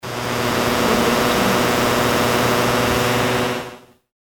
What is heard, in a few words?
electromagnetic interference